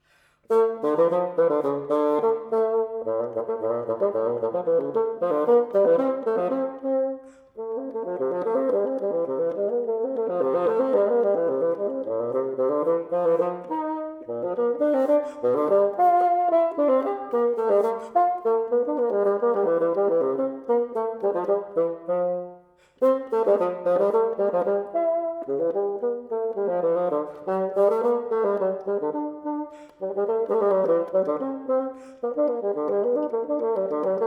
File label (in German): Bouree Anglaise gesetzt für Fagott Solo; J. S. Bach BWV 1013